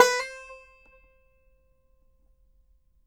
BANJO NOTES, C 5; Sampleheads; Whole Lotta Country 1 & 2

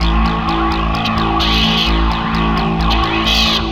Synth Hi Turn Static; Sonic Foundry (Sony Creative Software); Knutrix Drum & Bass

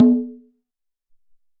Cong Hi

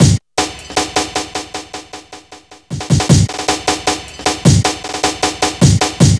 no agenda, Drumloops 155bpm; Drum Bass; Zero-G; Total Drum Bass

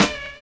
SLOW; Univers Sons

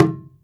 WAYANG KULIT F6, Heart of Asia; Spectrasonics - Heart of Asia CD2

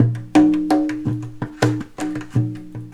Conga Loop, Ethnic Instruments; Sonic Foundry (Sony Creative Software); The Electronic Music Manuscript Collection

